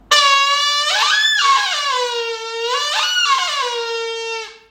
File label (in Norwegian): Jakthorn